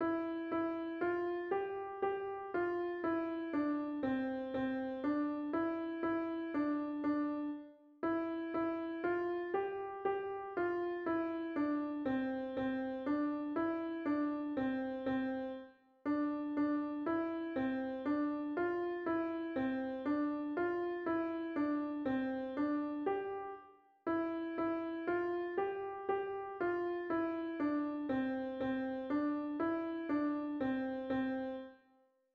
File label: ode to joy easy notes